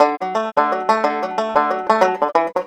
COUNTRY

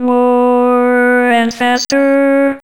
more faster, autotuned, Funky Vocals; USB Soundscan vol.18; AKAI

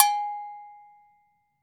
AGOGO BELL, Brazil; AKAI S6000 CD-ROM - Volume 5

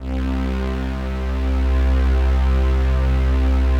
PAD, DIGI-PAD

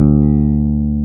BASSES, Roland

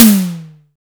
sfx perc

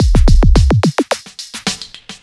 Beat, Drums & Percussion; Electrocution; Beats

